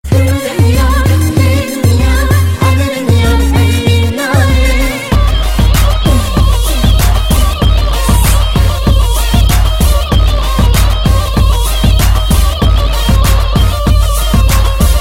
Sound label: Kurdish Dance